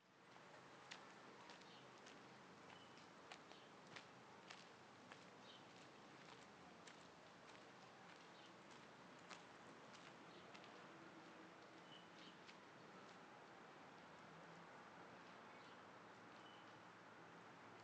Pas Gravier Clochette Oiseau; Petite Ceinture

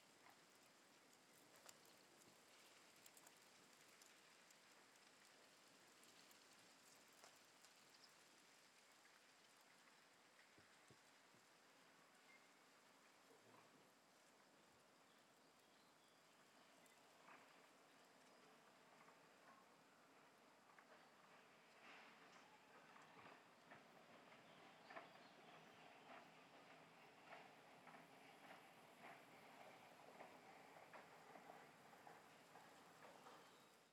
Friche Scierie Châtenois Vent Herbes Rail Scierie Pépillement

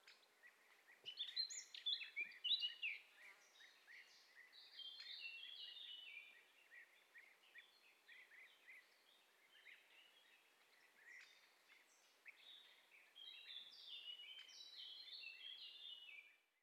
Friche Bord Forêt Oiseaux Et Grenouilles, Très Ancienne Carrière Lac

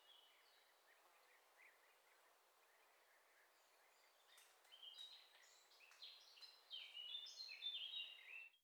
Très Ancienne Carrière Lac, Friche Bord Forêt Oiseaux Et Grenouilles Brindilles

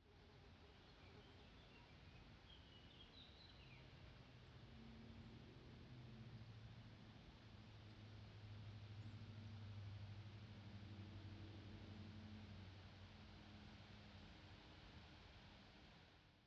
Très Ancienne Carrière Lac, Oiseaux Et Avion Au Loin

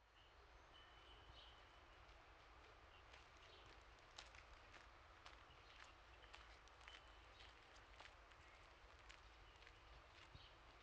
Petite Ceinture Pas Clochette Au Loin Pigeon Au Loin

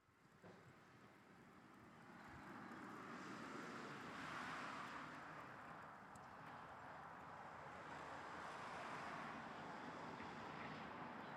Friche Scierie Châtenois Voitures Rail Scierie

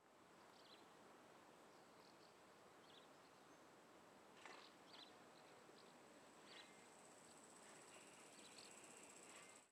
Pépillement Grillon Pelle, Friche Industrielle Rhinau

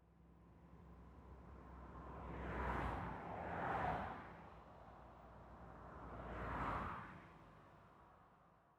Bord De Route Allemagne Passage Voitures

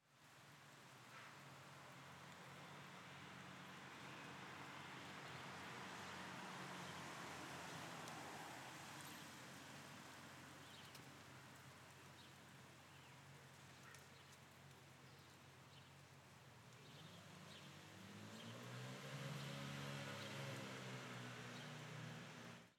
Vent Oiseaux Voitures Mobylette; Petite Ceinture

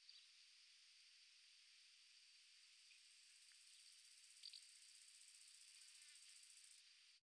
Friche Industrielle insectes grésillement; Rhinau

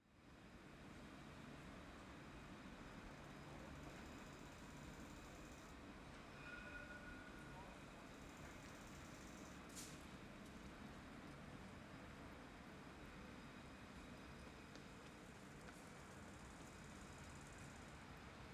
Tram Ding Conversation Sauterelle; Petite Ceinture

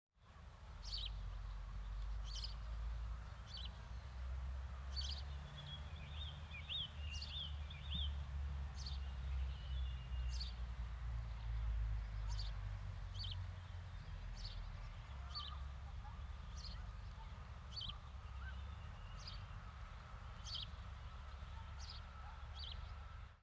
Friche Industrielle Rhinau Arbre Lierre Oiseau Et Voiture

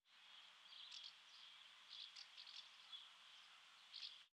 Arbre Lierre Oiseau Pépillement, Friche Industrielle Rhinau

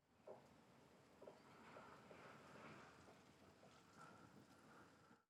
Friche Scierie Vent Herbes Rail Scierie; Châtenois

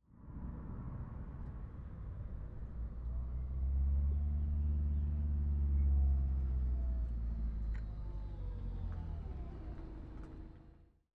Friche Scierie Voiture S'arrête Clic Photo; Châtenois

